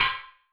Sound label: Klank